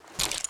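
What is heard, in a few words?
weapon foley mp5k reload lift; Call of Duty Modern Warfare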